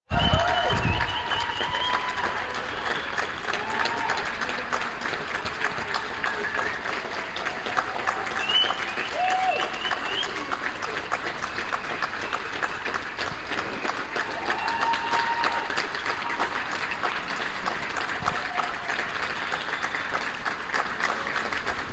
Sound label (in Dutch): applaus voor de zorg